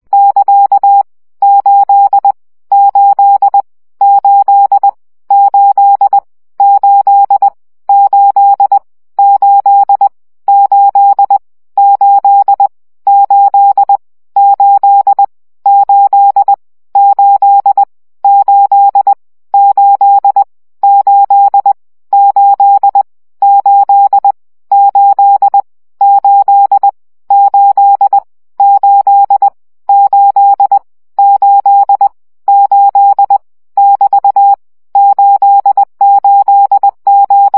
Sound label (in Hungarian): CW gyakorlas 1 17 baud